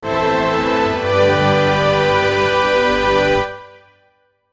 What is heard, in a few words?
the chaotic battle music